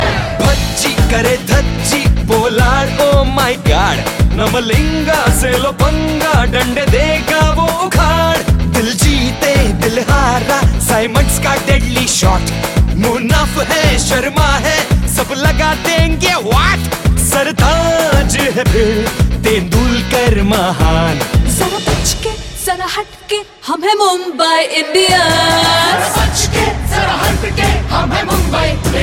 Loop Tone

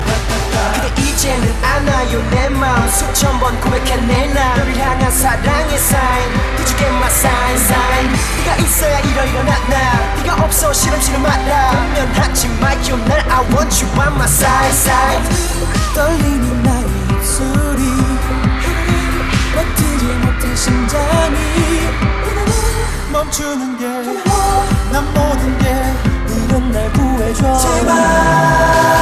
rap

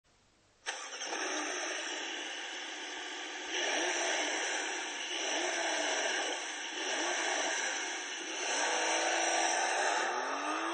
Porsche 911

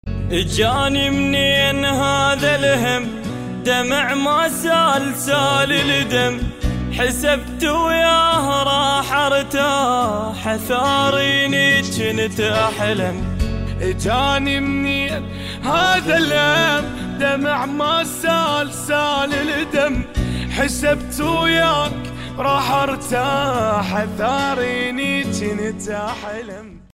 iraqi sad

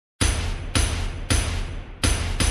Knock

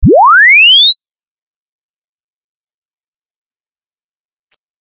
Iritating Sound

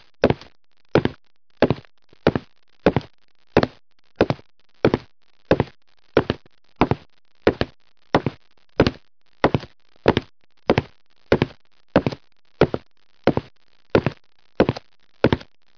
Footsteps Sound

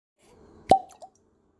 Cork Pop

